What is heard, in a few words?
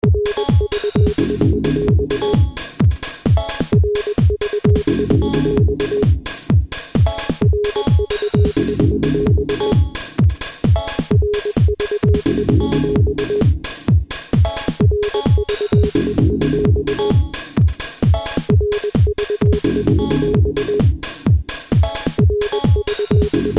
ringtone